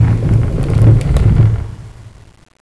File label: push box